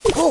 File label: gob step